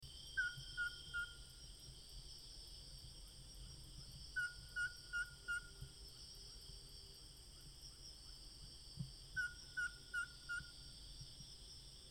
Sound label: Glaucidium palmarum; Colima dwerguil; uilen; Strigidae